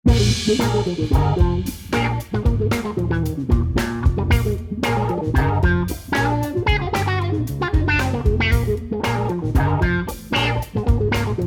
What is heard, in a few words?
Classic Funky Filter